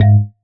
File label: marimba raincoat; ゆめ2っき